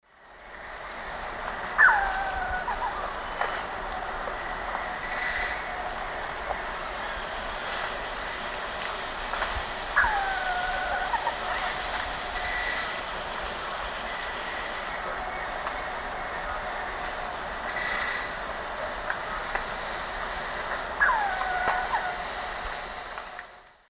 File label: Monasa nigrifrons, feeding calls; S. America; Eastern Lowlands